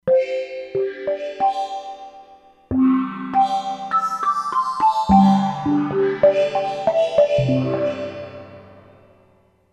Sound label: Back In Bowl, E-mu Proteus-3; Factory patches